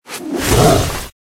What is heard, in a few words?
Tiger